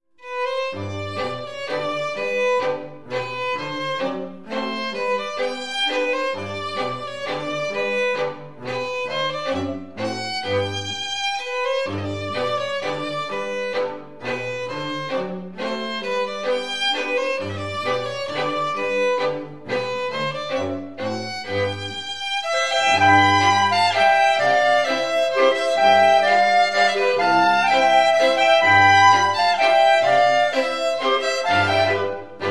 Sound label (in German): Drum Laendler-Trio